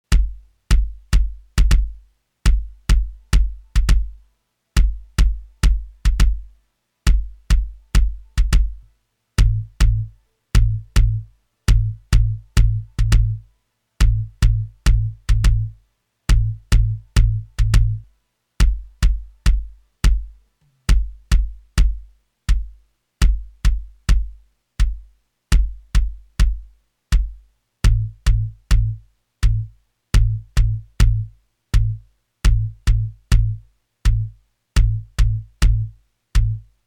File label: kick tone; audio examples from the book